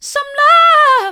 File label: mid, vocal